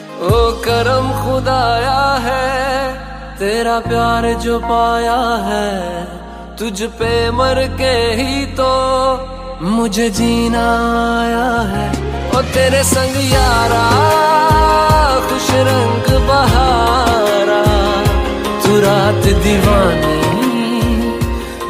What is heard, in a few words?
New Hindi Ringtone